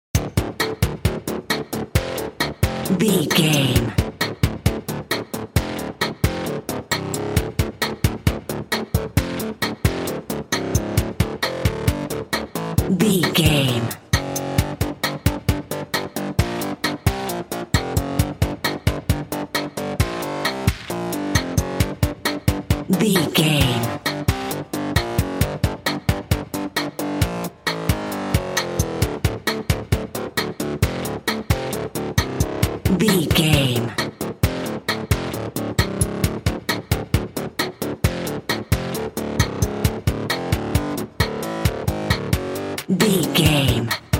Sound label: character selection screen video game dnb